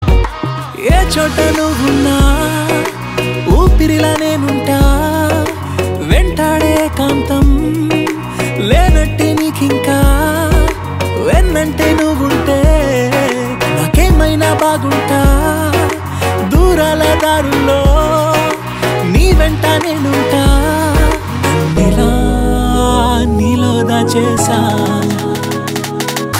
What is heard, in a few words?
Song Ringtone; Saaho 2019 Telugu Movie